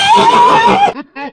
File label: pain, zombie poison; Half Life 2